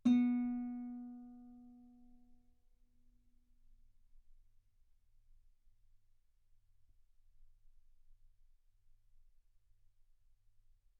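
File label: Guitar ff B string B3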